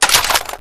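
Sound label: machine gun clip out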